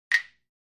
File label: Free Weights Exerci